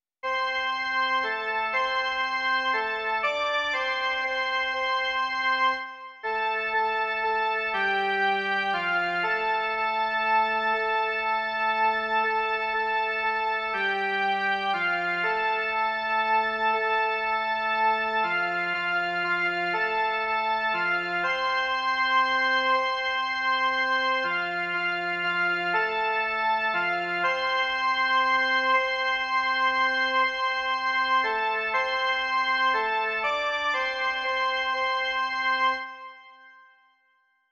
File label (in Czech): jen melodie; Sláva, sláva! Pán z mrtvých vstal!